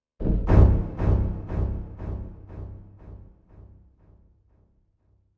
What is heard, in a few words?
Single Cello stab with delayed fade; Strings; Musical